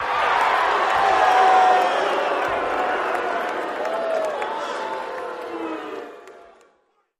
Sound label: Crowd React Good Guy, boxing